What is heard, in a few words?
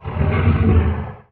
attack